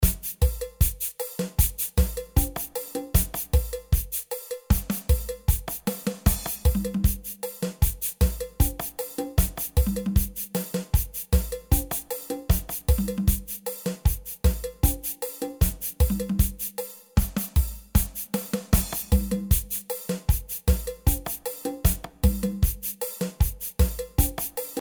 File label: Soukous Drums